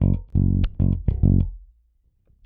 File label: Rock, Bass; Bass X